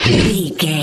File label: troll monster attack fast